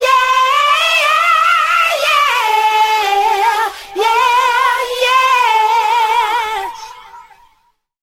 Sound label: yeah yeah yeahah yeah yeah SUNG, ooh ahh